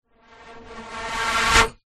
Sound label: air blow across top of metal pipe; zapsplat